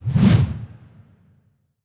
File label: Pendulum